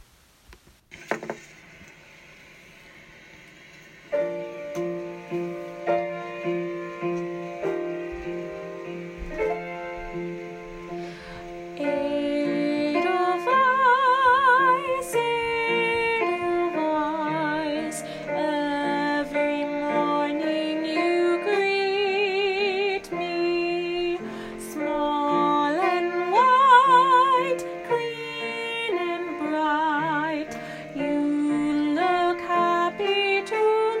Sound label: w vocals